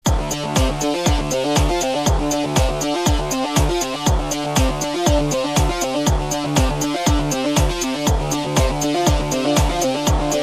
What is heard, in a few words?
delay 500